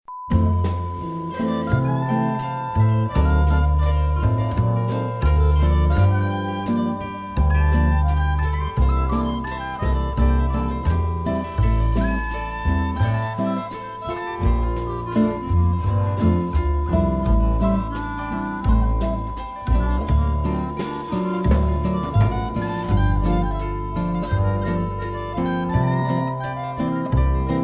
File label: cheerful time